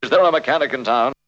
Vocal; ZERO G - Datafile DISC 2

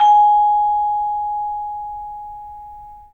vibes; 3D Sound; 3D Keys VOL-1

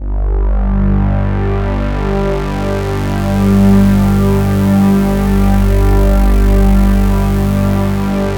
analog swell; Sound Gallery - Charged

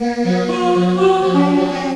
Choral loop; Urban Shakedown

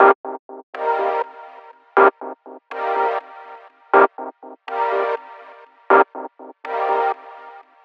122 Cm, Melodic Loops; M8; Electronisounds

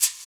Shaker; Equipped Music; Loungin House

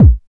909 KICK; East West - Phat & Phunky

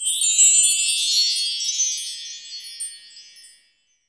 PRC WINDCH

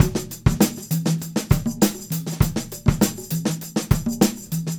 Combo, R&B Drums

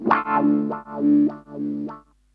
GTR; USB Soundscan vol.31; Miro s Grooves; AKAI

